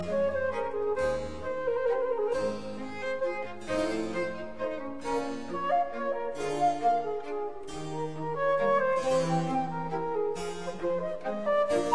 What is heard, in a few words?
Quartet Allegro; Quatrieme livre de 6 quatuors; No 3 in A major; TWV 43A4